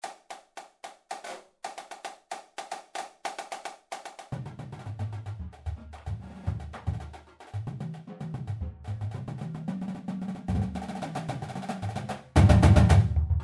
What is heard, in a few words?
marching percussion